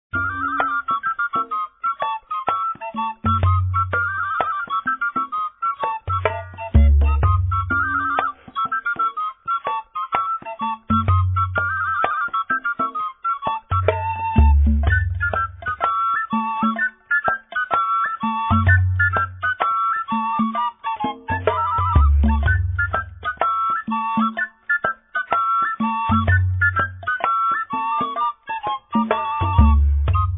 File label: sopilka